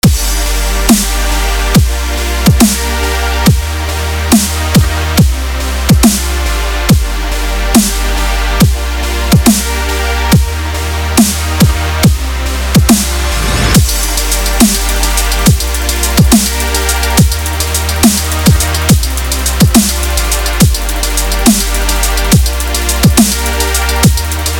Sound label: Wub Blaster